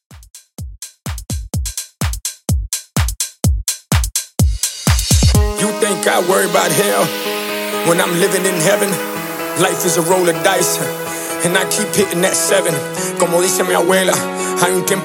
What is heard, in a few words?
128Bpm; Redrum